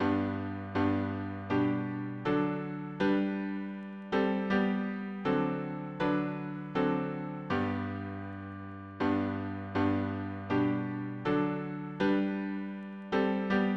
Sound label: harmony piano